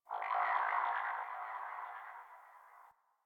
Landscape Distant Robot Call Low, Ambience; Warframe; Venus Open World; One Offs

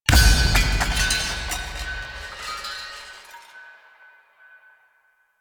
Cave Descent Mask Drop; Warframe; Veilbreaker; Kahl

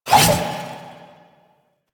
Two Handed Nikana Spin Swing; Warframe; Duviri; Duviri Dax; Weapons

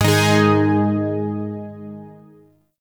Shawzin Chromatic Chord; Zariman Shawzin

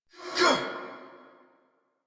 Attack Effort; Warframe; Dax Ashigaru; en

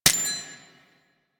Mech, Weapons; Warframe; Gotva Prime

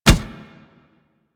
Kubrow Run Metal, Footsteps; Enemies; Warframe

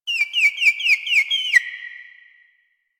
Drifter Camp Bird Close; Ambience; One Offs; Birds; Warframe; Quests; The New War

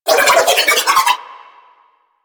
Nunchaku Quick Spin Whoosh; Weapons; Warframe; Tenno

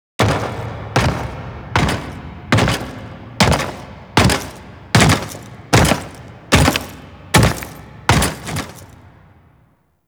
Boss Fight Steps; Warframe; Captain Vor; Captain Vor Redux

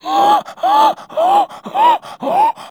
Infested Runner Breathe Quick; Warframe; Runners

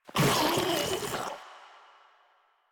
Infested Ancient Attack; Warframe; Ancient Ninety Nine; Proto Infested Ancient

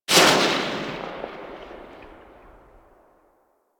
Pistol Tail, Weapons; Harlequin; Warframe; Tenno

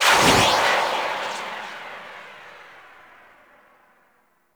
Archwing Healer Engine Boost Slow Short, Vehicles; Warframe

